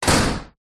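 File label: Small Metal Door Slams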